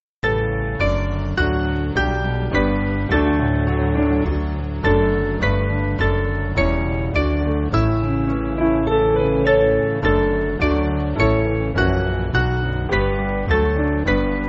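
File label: Piano, Backing Files for Churches; Doncaster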